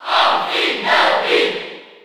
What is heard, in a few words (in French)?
Cheer French NTSC; Greninja; SSB4